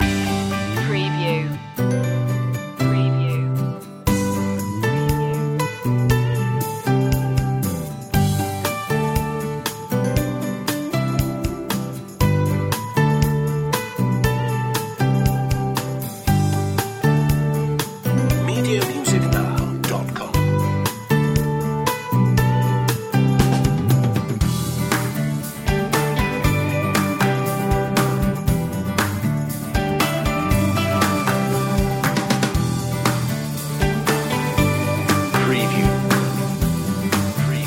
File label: just smile loop